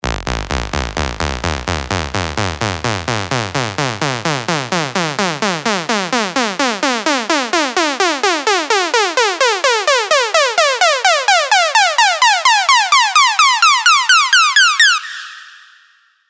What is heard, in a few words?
Uplifter